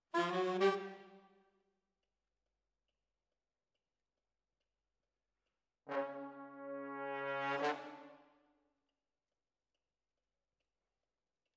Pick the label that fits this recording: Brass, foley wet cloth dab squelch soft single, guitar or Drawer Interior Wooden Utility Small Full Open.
Brass